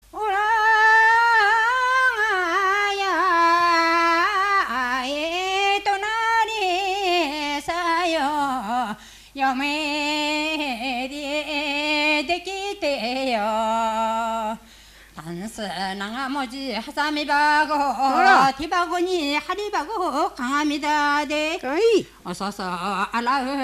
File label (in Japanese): sumo jinku; 山武市; 相撲甚句